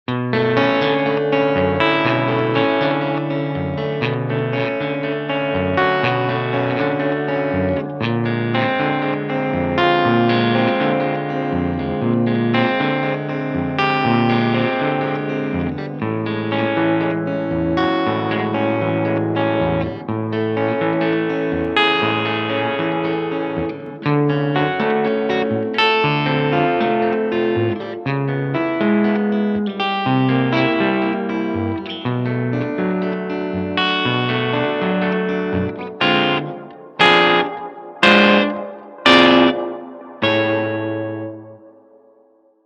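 Close harmony fingerpicking and steady bass interact through Class A tube and transformer saturation while provi; Italian / Summer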